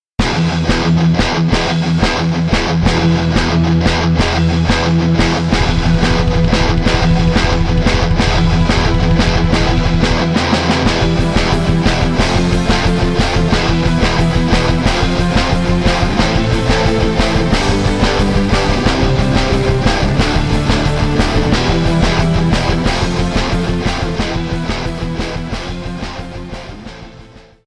Rock Tracks

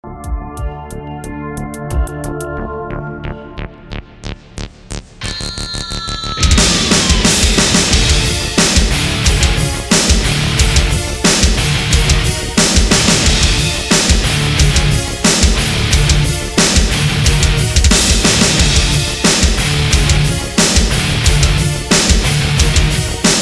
Metal

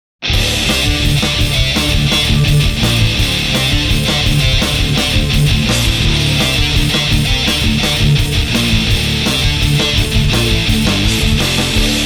Rock Tracks

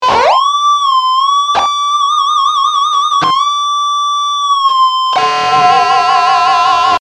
2 notes

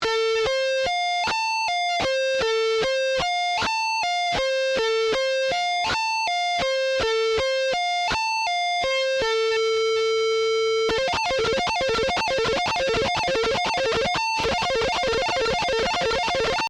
F Major, sweep picking

